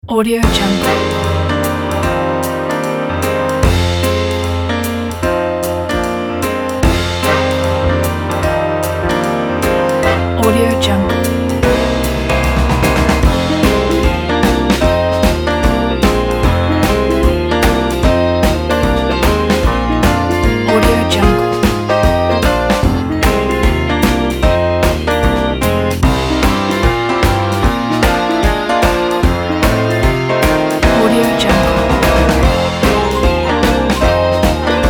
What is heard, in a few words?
jazz